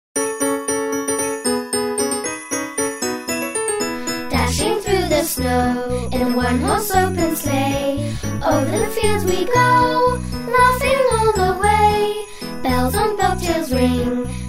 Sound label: song jingle Bells